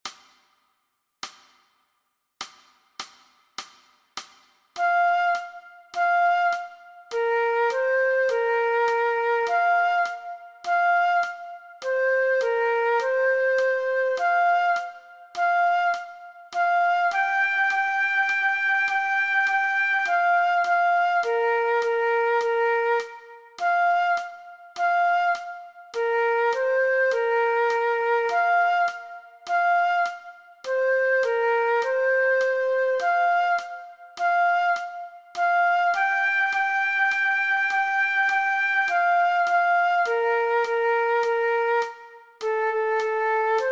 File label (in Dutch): Meespeeltrack Dwarsfluit; Dwarsfluit aangepast; Nij Talint Orkest